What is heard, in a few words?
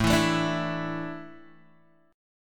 A Suspended 4th, Asus4 chord; strummed; x,0,2,2,3,0; JGuitar